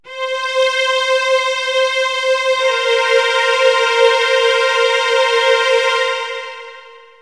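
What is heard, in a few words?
major second descending; Нисходящая последовательность C-B; Целый тон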